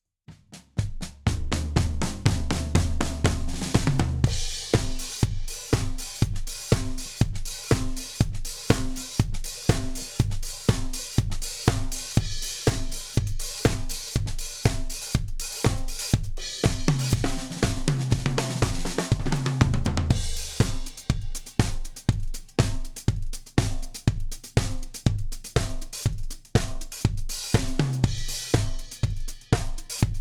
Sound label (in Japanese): ドラム サウンド ダンス ビート